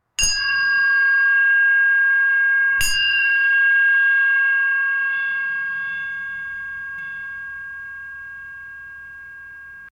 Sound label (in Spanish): campanas medievales usada, bells